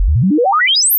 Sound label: Sweep pan, Synth